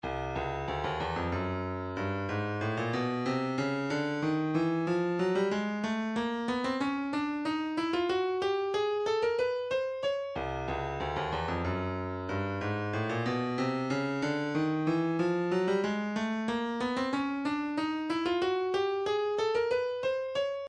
120 room; Slide

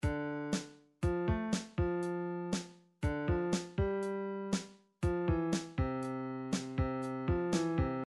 rock, midi

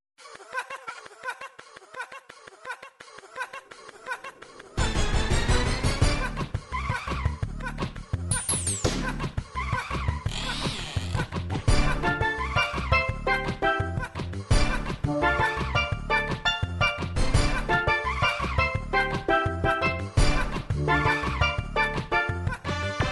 drumtrax library sfx

